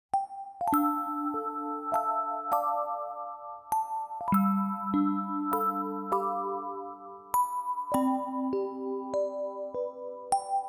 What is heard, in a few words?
orgel ver.-; majipuri wonder cradle original soundtrack